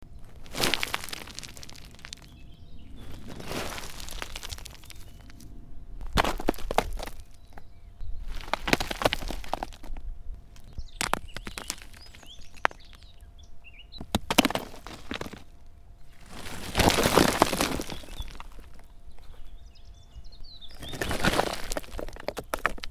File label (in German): steine